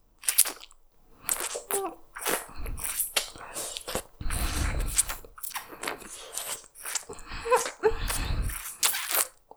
耳責め 吐息多めに・じゅるると 音のみ; ループで楽しむ！シェフの気まぐれ抜き抜きコース

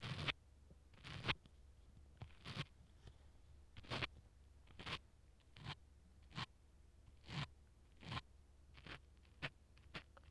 耳かき 左, セリフなし; セリフなし差分

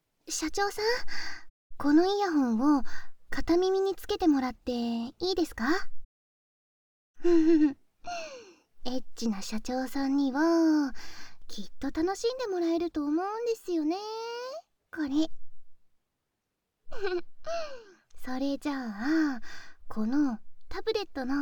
耳舐め, バイノーラルマイク 効果音なし; ハメ録り映像を見ながら耳舐め手コキ; ハメ録り音声なし

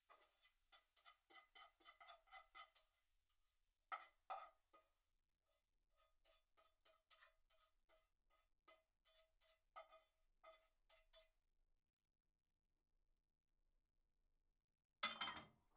炒め物をお皿に盛る; KU100

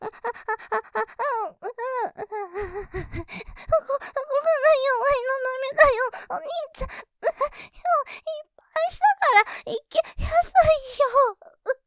喘ぎ◆そこ弱いの ダメだよ イキやすいよ, ASMR; モノラル; ループで楽しむ！シェフの気まぐれ抜き抜きコース

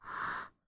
ブレス ミディアム

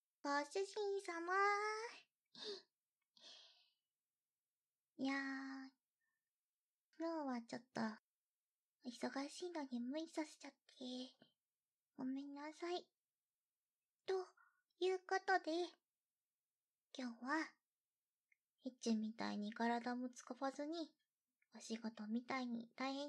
ゆったりと耳かき, ASMR

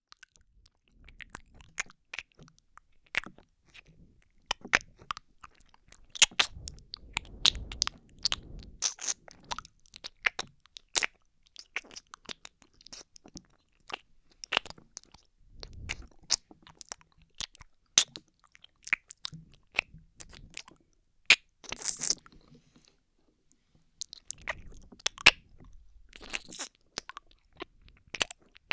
優しくお耳を吸ったりキスしたり 両耳同時, ASMR

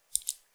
くちゅ音単発

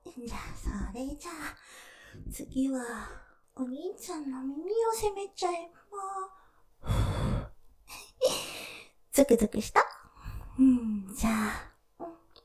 耳責め お兄ちゃんの耳を吐息攻め; ステレオ

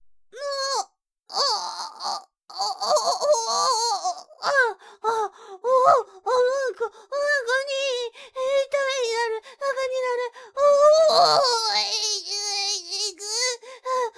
ノーマル マイク んおぉっ おっ おっ ほぉっ

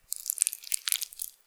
普通, 挿入 or 引き抜かれ終わる直前; ■挿入or引き抜く

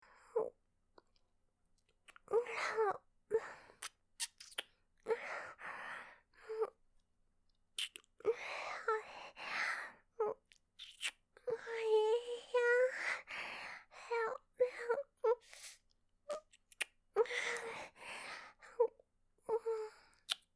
キス(ディープキス); 純真無垢な妹の無邪気なゆ～わく

